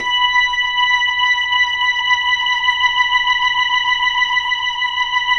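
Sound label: 4B R, Slow Fast Hammond, Organs; E-MU Producer Series Vol. 5 – 3-D Audio Collection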